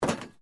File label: METAL SCRAPE SMALL; Sound Effects:Materials